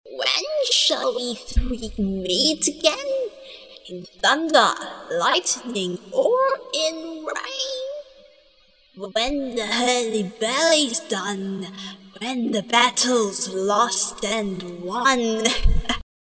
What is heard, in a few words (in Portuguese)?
bruxa corredores, ruidos